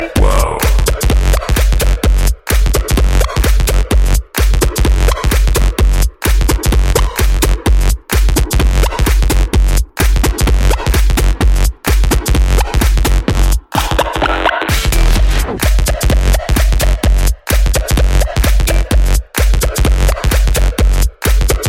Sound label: Wow Rock; meloboom